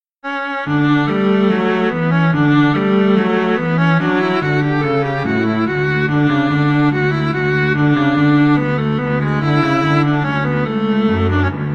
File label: march in d, viola cello duet; bach; bwv anh 122